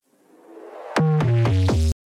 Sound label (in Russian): barabannij boj